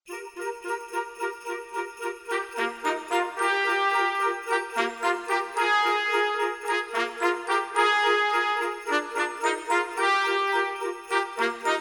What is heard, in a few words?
jingle bells upside down, band